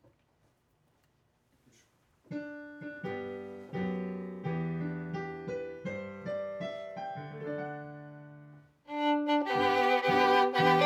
Viola C

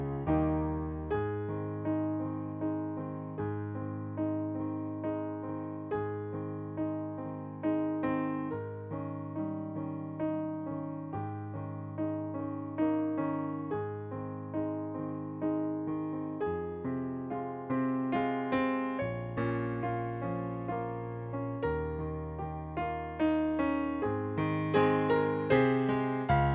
Piano

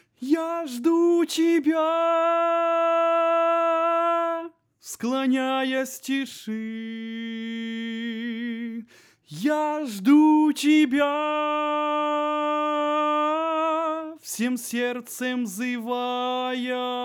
tenor

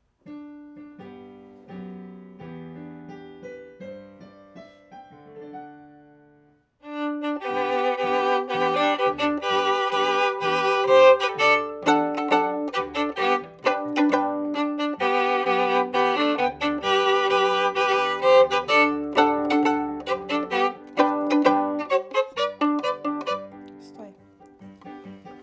Viola C

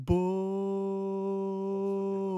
bass

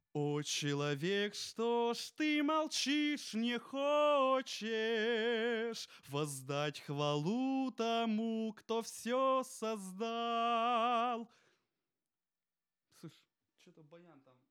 vocal

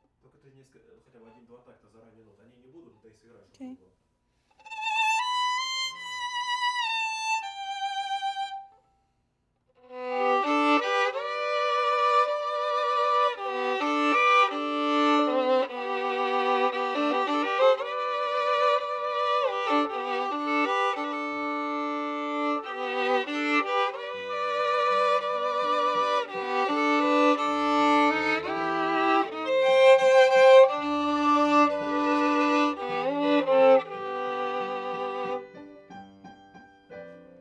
Viola C

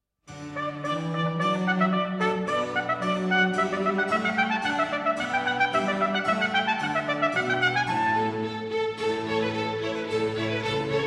Trumpet Concerto in D Allegro; Telemann

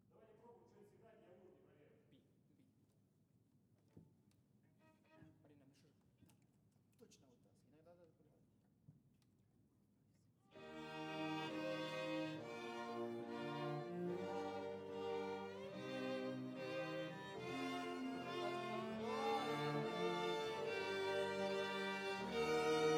Overhead

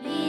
Fade

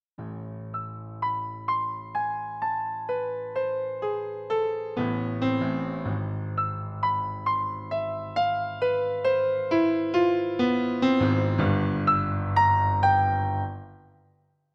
Piano

